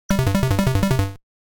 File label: running Out Of Time; Frogger; Arcade